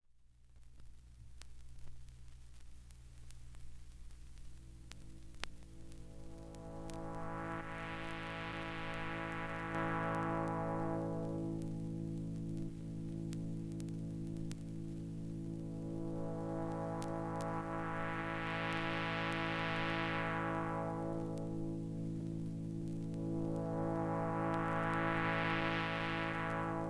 Moog

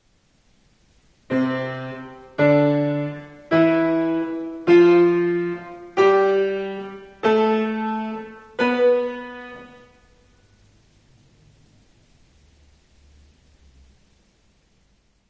Scale work 7 notes

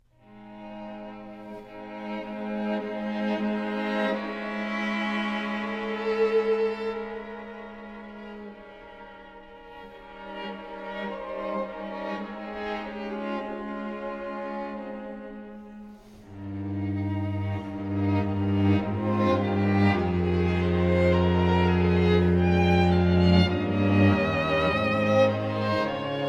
String Quartet Adagio non troppo; Op. 44 No. 3

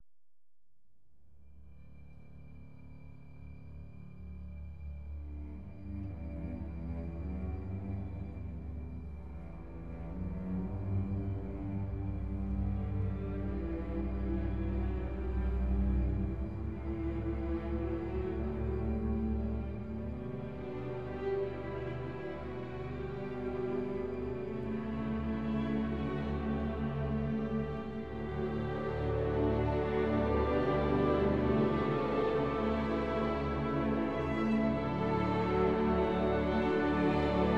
Lux Æterna Introitus Orchestral Introduction

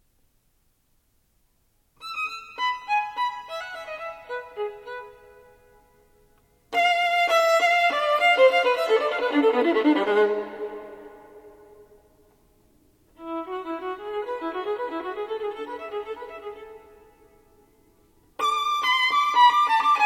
Sonata for Solo Violin; Ysaÿe; Op. 27 2; Obsession

